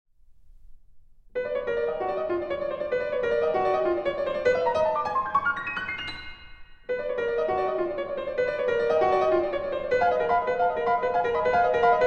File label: Bagatelle Piano Solo; Carl Vine